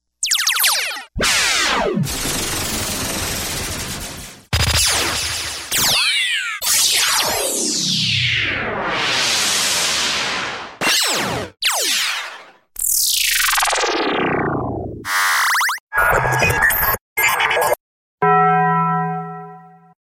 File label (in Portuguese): EFITOS LASER; pack de tilhas e efeitos 2.0